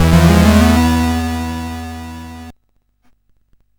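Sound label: Nebulus, MOS 8580 SID chip music, games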